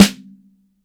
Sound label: MIX SN, DRY STANDARD SNARE